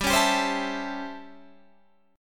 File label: A 7th Sharp 9th